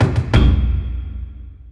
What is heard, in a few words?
death screen